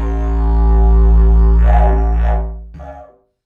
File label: Didgeridoo Kit Samples